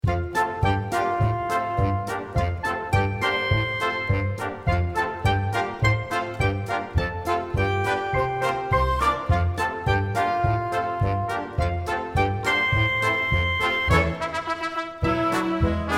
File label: Guided Vocals; Cinderella